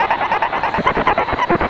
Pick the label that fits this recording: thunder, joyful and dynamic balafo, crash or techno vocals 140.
techno vocals 140